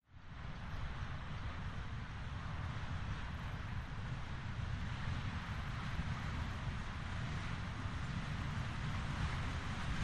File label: Carriage 4 Wagons